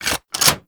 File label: Ghoul Drill To Flame Thrower Equip; Warframe; Grineer Ghouls; Ghoul Drill Sergeant